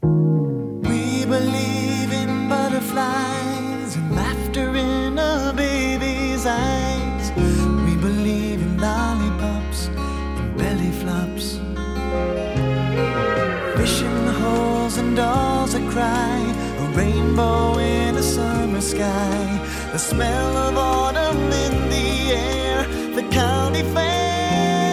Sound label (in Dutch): omroep jingles